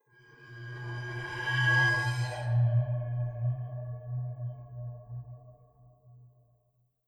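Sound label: underground ambient; blackforest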